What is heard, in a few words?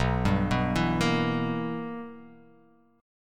B Major 7th Suspended 4th Sharp 5th, chord